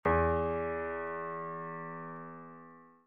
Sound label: Piano ff E2